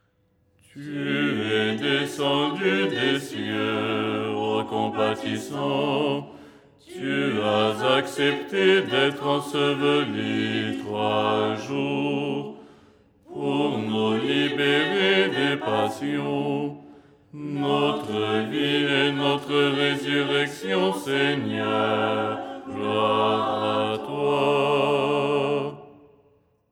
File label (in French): basse